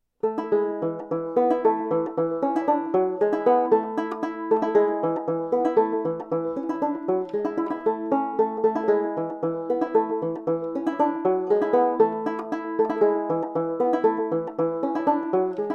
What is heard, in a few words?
jim crow polka, banjo; early fingerstyle banjo